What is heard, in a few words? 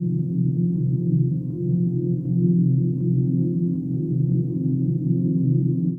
sphere ambient 160